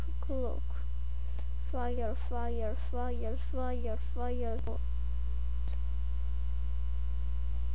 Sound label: fire